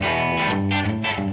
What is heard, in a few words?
180 rocking rhythm guitar